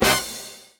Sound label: Tennis Hit; Party Crasher - Combo